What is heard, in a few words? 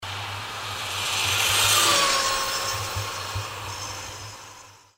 passage, elmotorcykel